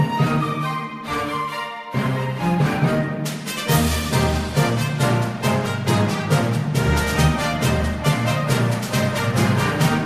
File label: gym victory; oras